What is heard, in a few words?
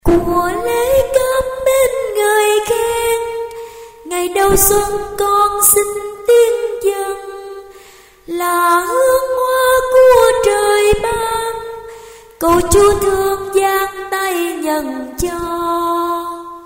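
tenor soprano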